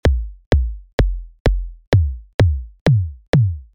kick; p peak

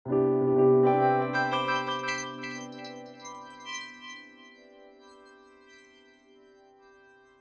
piano; portal

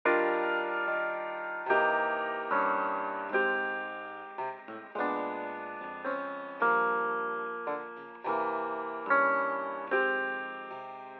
lofi piano amp

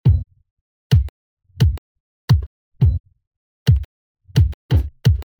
regroover kick

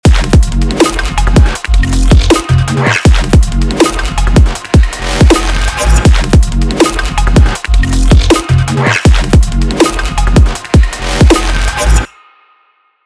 sidechain on

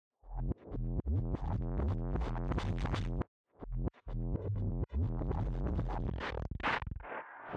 drum; portal

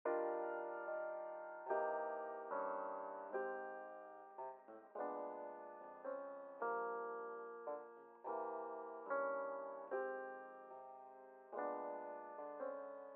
lofi piano eq

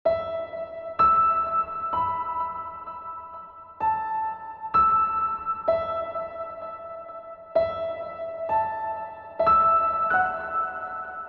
piano

